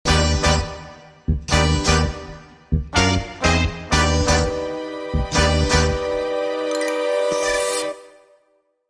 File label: Retro Film Suspense Hits